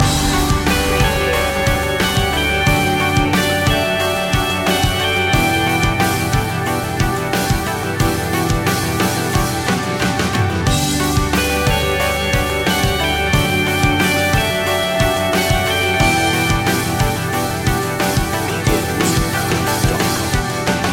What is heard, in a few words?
sunshine trip loop